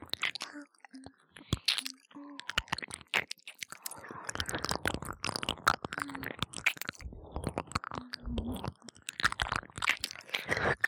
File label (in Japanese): 両耳舐め, ASMR